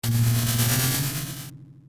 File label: mecha; ff3us